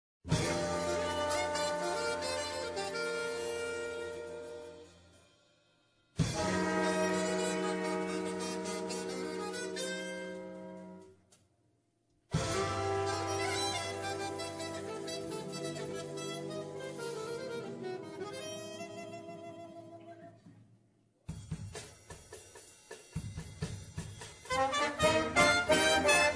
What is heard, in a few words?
Samba; SambaNautica